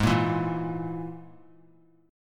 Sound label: Ab Suspended 2nd Sharp 5th Ab down; strummed; JGuitar